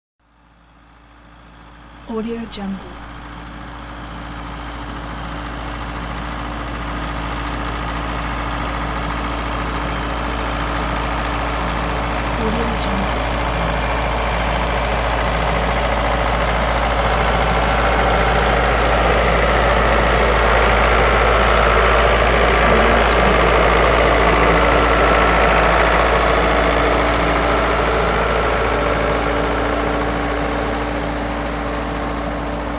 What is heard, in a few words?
tractor passes by; palmirasoundstudio